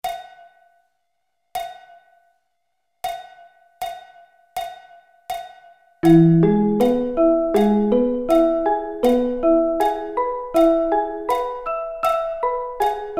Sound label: E Arpeggio Inversions